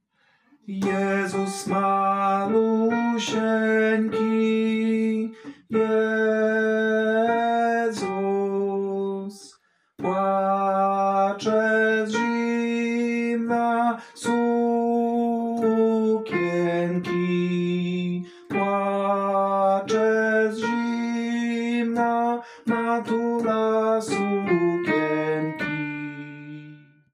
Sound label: Tenor; Jezus Malusienki; nagrania glosow warsztaty2026